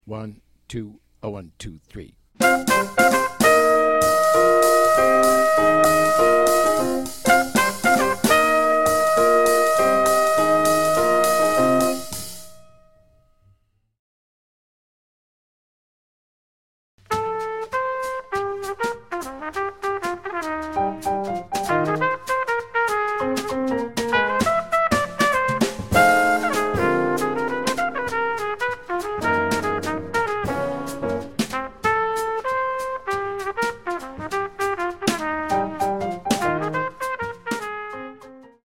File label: minus bass